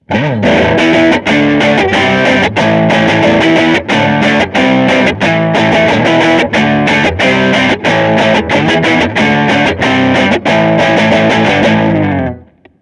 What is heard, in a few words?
twang; line6; sortie pod